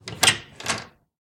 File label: door open